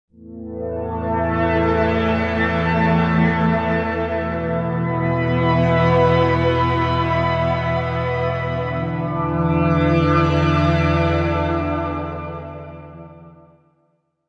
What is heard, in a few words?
pad